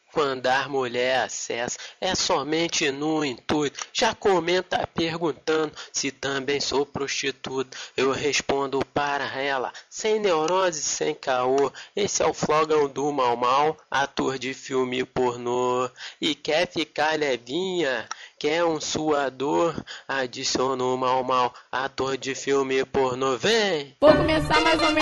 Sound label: funk